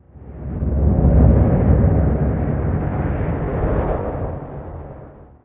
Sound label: Ambient; unreal development kit